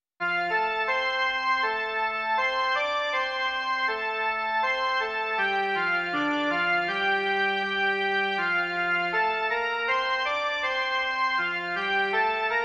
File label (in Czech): jen melodie